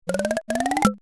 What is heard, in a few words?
button